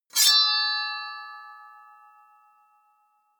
sword wield ringing bright

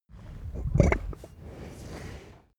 cow burp

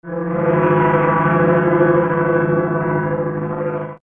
metal scrapes

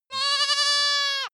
goat baa lamb classic

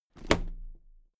toolbox close drop lid heavy

